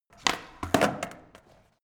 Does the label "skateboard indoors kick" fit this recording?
Yes